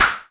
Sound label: noise fx; Masterbits; Switch FX